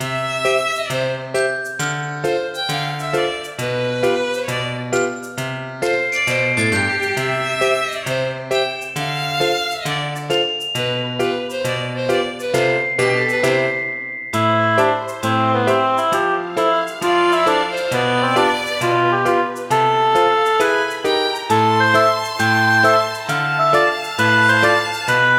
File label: BGM; Heroland